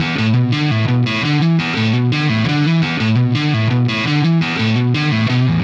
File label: Static Guitar; Sonic Foundry (Sony Creative Software); Indie Rock Anthems; Session 13 - Static